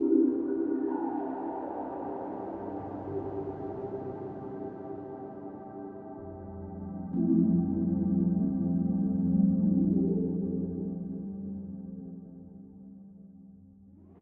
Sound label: feature Splash Loop; netent; doublestacks